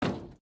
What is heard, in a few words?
tin, step